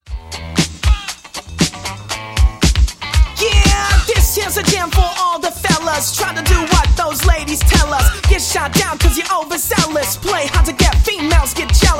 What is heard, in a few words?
Rap style; Internet Session, Los Angeles